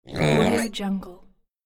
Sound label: piglet grunt